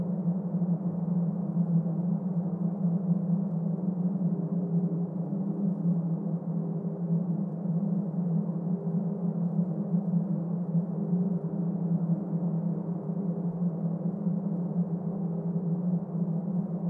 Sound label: Terra Ambience; MechAssault 2: Lone Wolf; Xbox